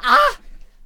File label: Attack Vocals; Snake Archer